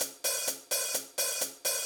hat loop

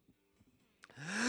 breath lead vox

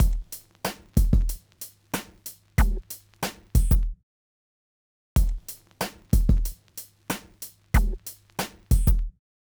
Drums

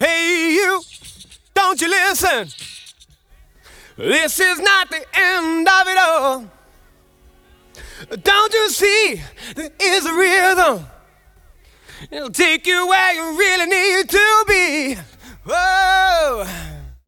Verse Vocal; Multitrack; Remix Parts

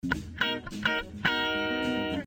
Clean Guitar

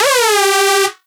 G Pitchfall, Dubstep Synth Hits; Oneshots; Serious Dubstep

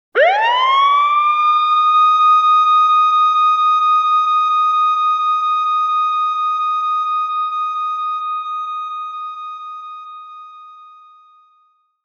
siren

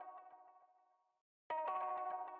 guitar

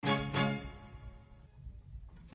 Strings

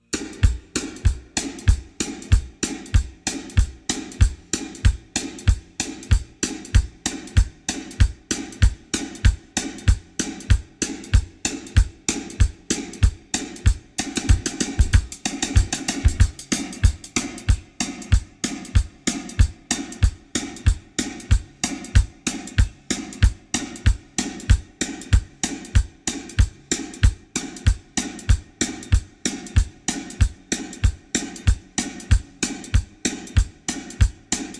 drums

